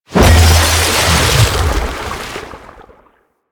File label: Pilot Breakout Hit Three; Warframe; Amalgam Carrus